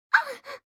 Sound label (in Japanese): 被ダメージ; フリーデ